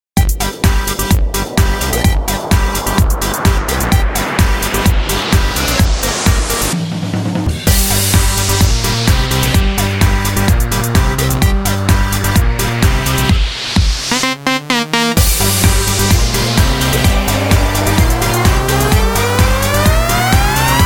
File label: karaoke